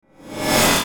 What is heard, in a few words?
Hit Reverse Suction; Sound Design; Blastwave